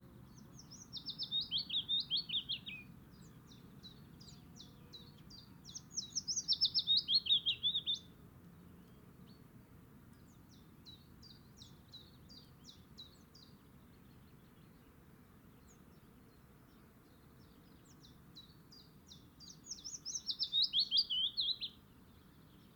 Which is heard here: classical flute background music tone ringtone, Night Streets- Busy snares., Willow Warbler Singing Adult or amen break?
Willow Warbler Singing Adult